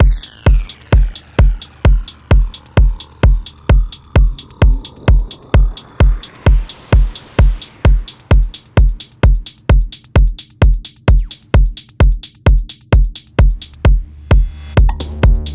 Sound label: collapse FX techno; DAW